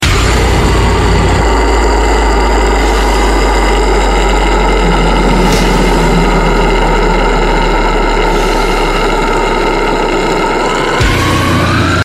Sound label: growl